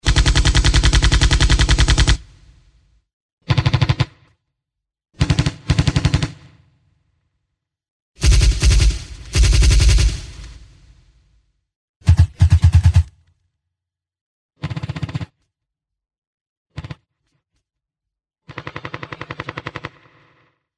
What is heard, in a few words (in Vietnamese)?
Tieng sung tieu lien